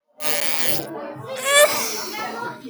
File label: fart moan